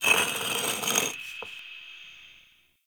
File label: Stone Small Scratches; Warframe; Ambience; Entrati